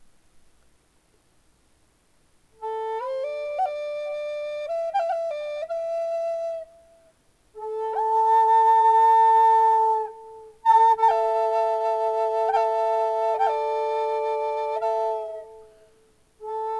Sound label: hackberry drone a, flutes